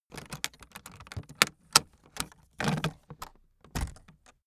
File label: aluminium case handling